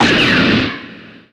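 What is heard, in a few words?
Battle Gun Critical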